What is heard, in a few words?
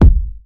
kick